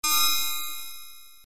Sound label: block sword; ff3; ff3us